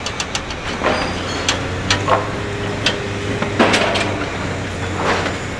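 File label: Prop turret construction loop; Star Wars Clone Wars; XBOX; Xbox Live Effects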